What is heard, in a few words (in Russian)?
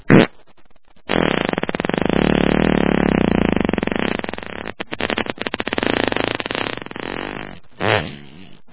pukane